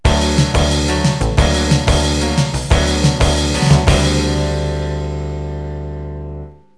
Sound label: mod beat, music